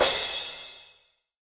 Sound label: normal hit finish